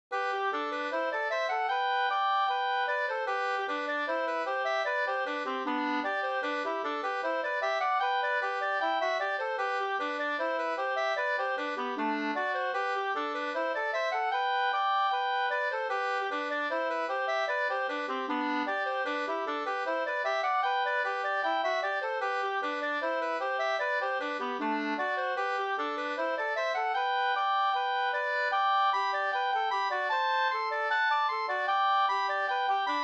engelska

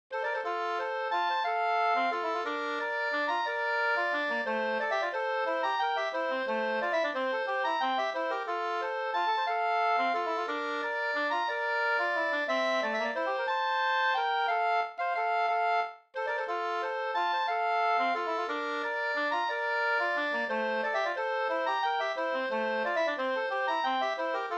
vals bondvals

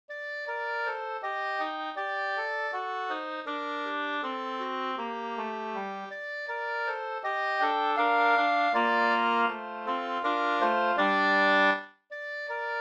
vismelodi, Andante; Andante i viston av Wilhelm Gelotte, Skutskär, Uppland; med andrastämma av Herbert Jernberg

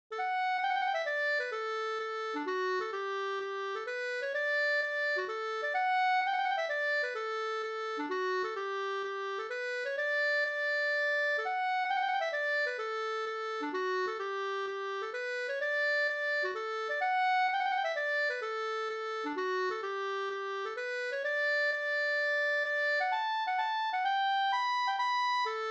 polska Bodapolska

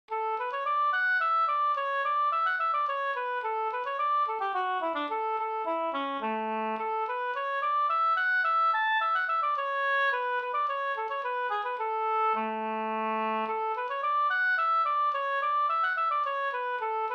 slängpolska

